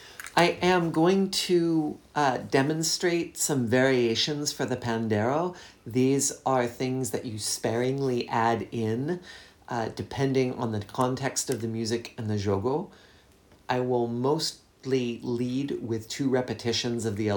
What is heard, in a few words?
Pandeiro variations demonstration; Click below to listen to me demonstrating these pandeiro rhythm variations; Capoeira Music Practice Material